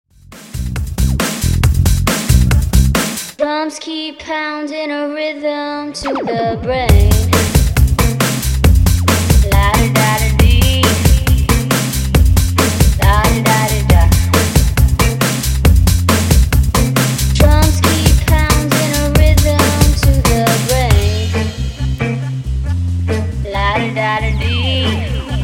Funk, 137Bpm

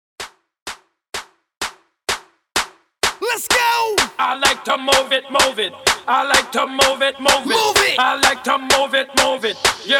PARTY STARTER, 127 BPM

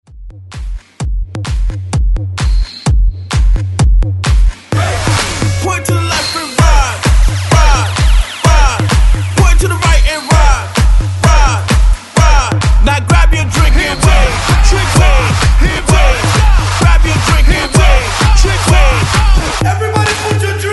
Re Edit 129Bpm; remixplanet

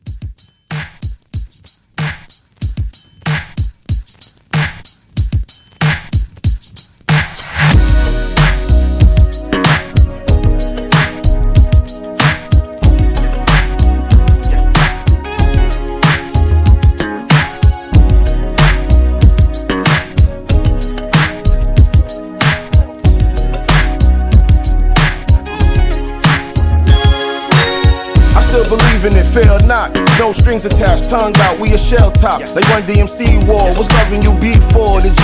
Mixshow Re Drum 94 DIRTY

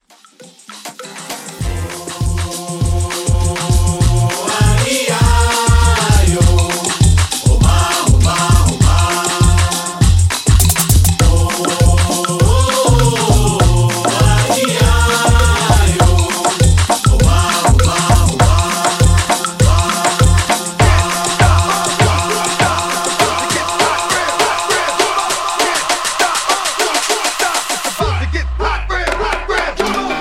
Latin Hype Reboot; Remixfreakz

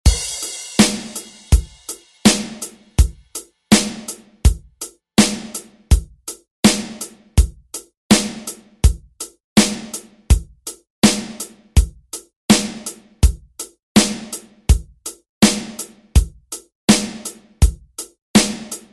70s ReDrum; DJ Mhark

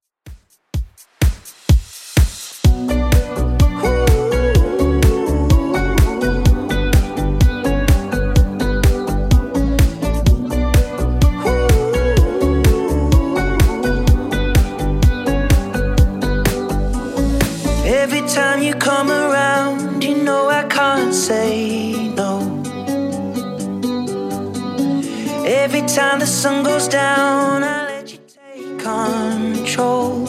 Top40 Simple Redrum 126bpm

